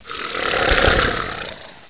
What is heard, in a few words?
growl; Quake; PainKeep